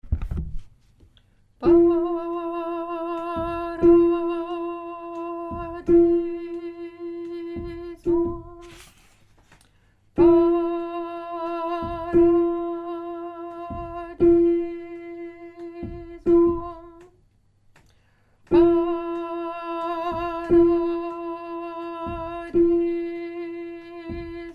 lettre G soprano, chorale

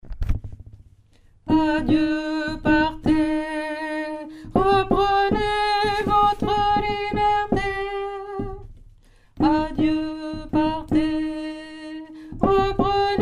alto mesure, chorale; saltimbanques

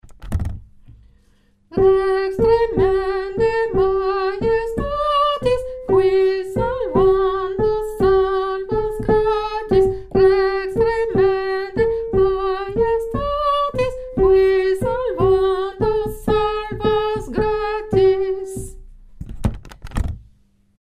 lettre G tenor; chorale

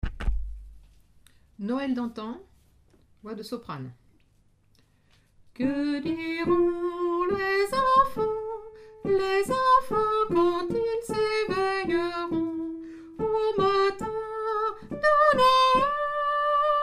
soprano; noel dantan

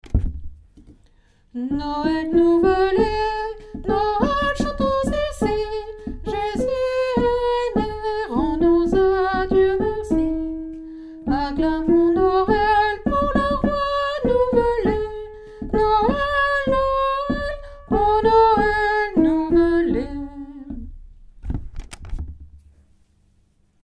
noel nouvelet soprano tenor; nouveaux noels; chants noel

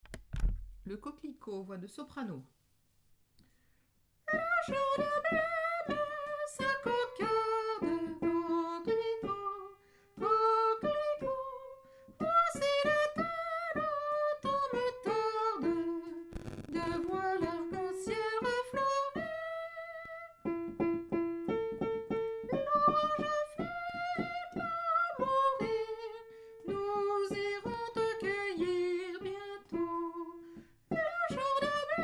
le coquelicot soprano, choeur femmes

